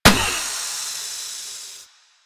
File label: Car Tire Pop; Warframe; Ambience; Nineteen Ninety Nine